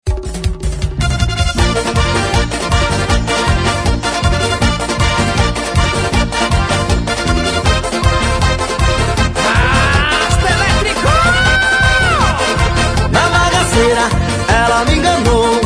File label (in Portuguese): Forro